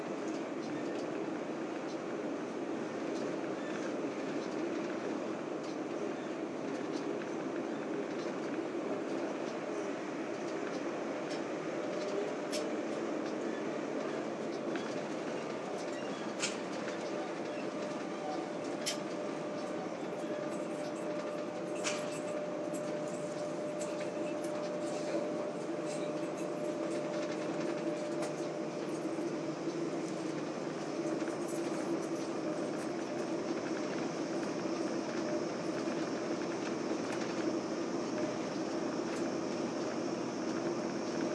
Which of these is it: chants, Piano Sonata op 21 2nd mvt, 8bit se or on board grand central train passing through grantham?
on board grand central train passing through grantham